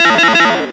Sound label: Electro FX; UNUSUAL KITS and FX; Ueb HE Electro FX